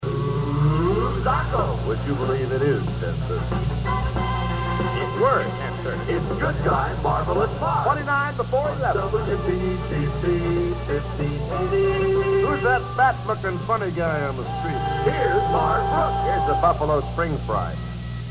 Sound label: jock jingles; spot productions; marvelous marv brooks